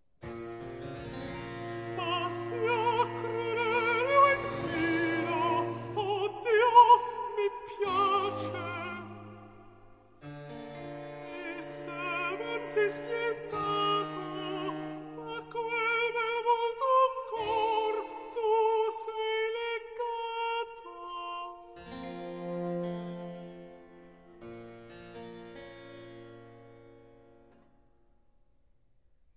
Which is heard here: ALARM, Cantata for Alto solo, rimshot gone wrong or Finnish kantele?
Cantata for Alto solo